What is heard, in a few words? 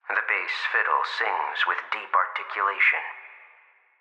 Puzzle Diary Bass